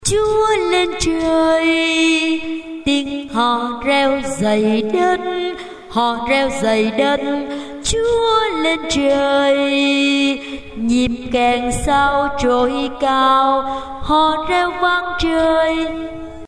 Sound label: alto